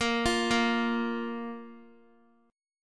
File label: Bb 5th Bb Guitar; Bb5 arpeggiated